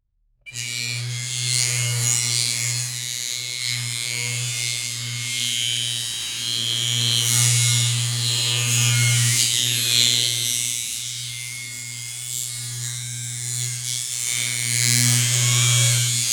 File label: the sounds of a razor